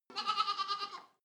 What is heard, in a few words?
goat lamb baa distant